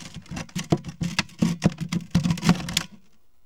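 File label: BOX RATTLE, Box Noises; E-MU Producer Series Vol. 3; Hollywood Sound Effects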